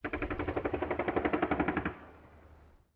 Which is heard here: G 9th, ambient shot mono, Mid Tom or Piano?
ambient shot mono